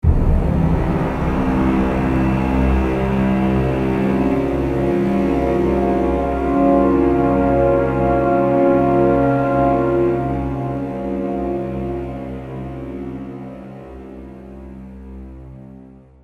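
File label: Music Success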